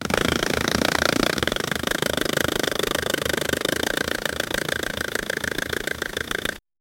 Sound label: cartoon character running in place before zooming off